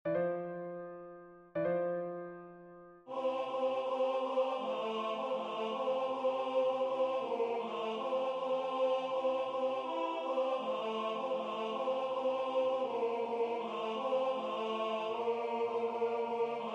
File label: tenor